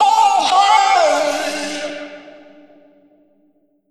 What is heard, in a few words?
phrase Dm, Vocal; Slate Digital Omnivox Vocal Sample Pack